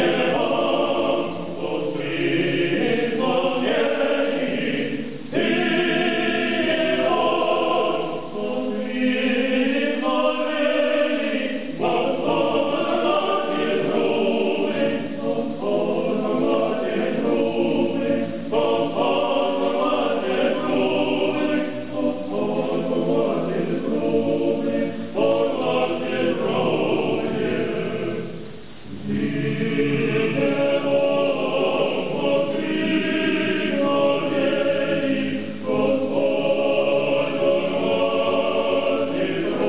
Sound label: Russian Monks; StPetersburg